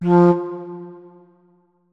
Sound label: flute notes; Hideout Furniture